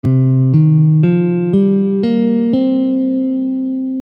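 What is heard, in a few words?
Penta min C, Gammes & Modes · Guitar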